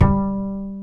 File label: acoustic bass